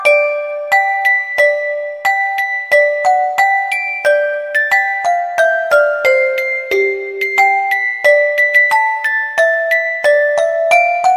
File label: CHIME JINGLE BELLS RINGTONE